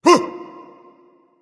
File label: surprised, voice; Smite; ymir skin6